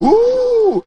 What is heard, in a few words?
"woo", voice